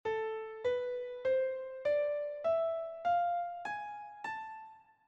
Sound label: A harmonic minor